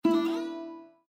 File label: Print complete; Raga